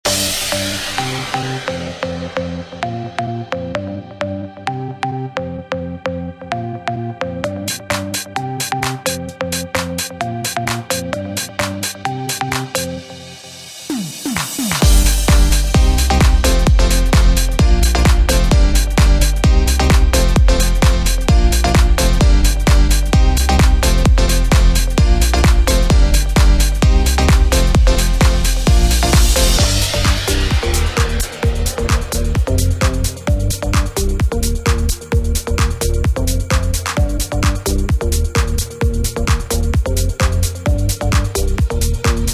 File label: House EDM anthems